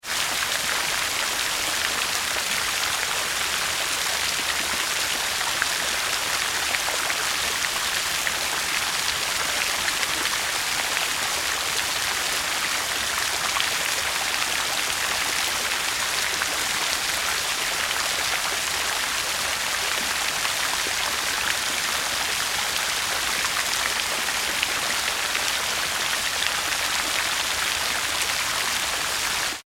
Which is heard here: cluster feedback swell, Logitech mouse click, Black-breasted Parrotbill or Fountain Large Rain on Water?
Fountain Large Rain on Water